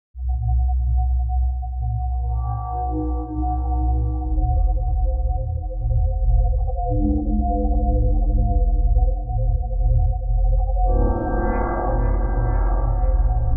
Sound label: Padshop 2